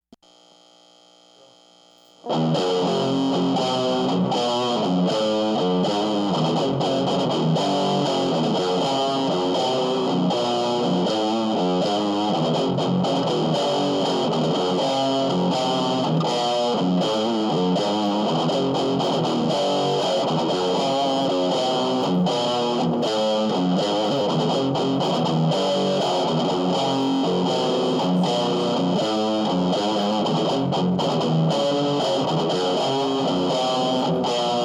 Riff

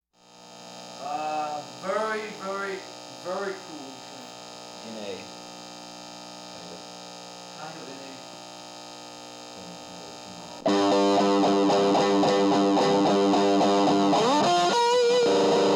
Riff

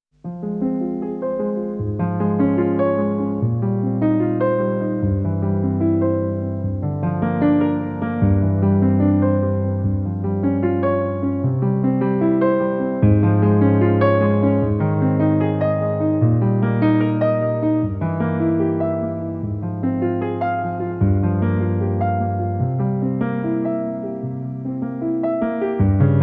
(Instrumental)

